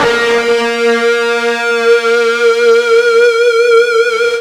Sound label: FUZZ A#2, ULTRA FUZZ, Guitar; East West; Steve Stevens Guitar Samples Collection